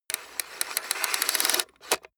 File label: plastic mechanical draw rattle lock